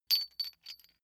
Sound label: metal chain medium handling